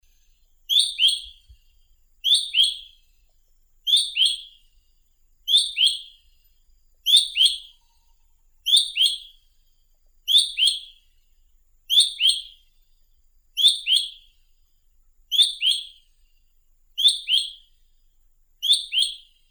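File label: broad-winged cuckoo, Hierococcyx fugax; kukushka shirokokrilaya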